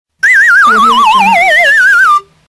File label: slide whistle down